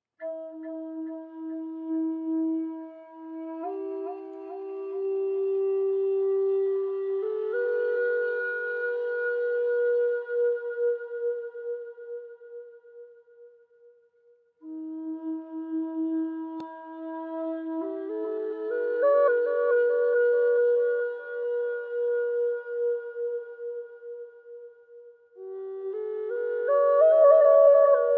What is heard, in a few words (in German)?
Walnuss E 432 mit Schall, drone flute